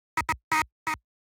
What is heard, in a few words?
Motor PowerDown Stinger; Hustlebonez Founder